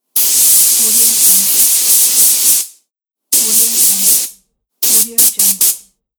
air compressed; davide martini

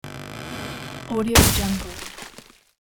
large tree fall

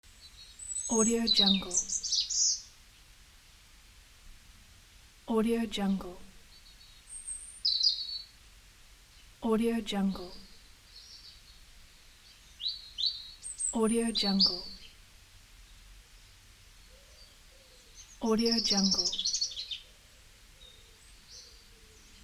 urban garden ambiance

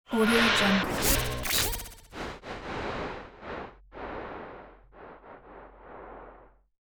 ghost transition

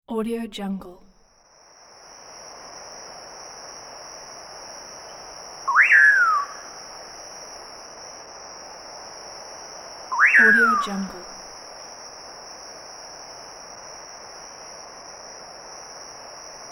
quiet jungle

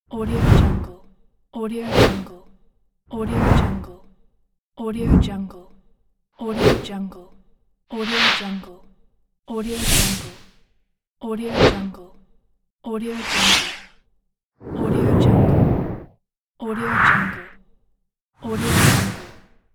wooshes

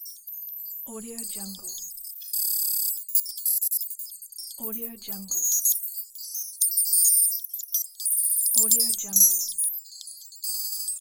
hi tech text

